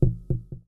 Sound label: activate bounce, mono; dokobots